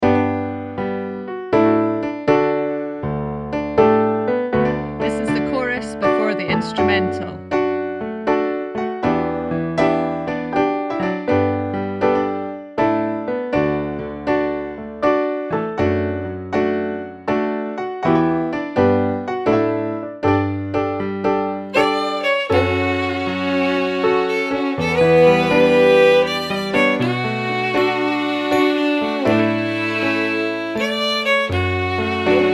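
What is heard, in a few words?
Creag Ghuanach instrumental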